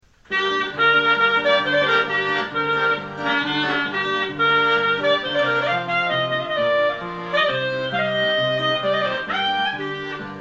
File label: Klezmer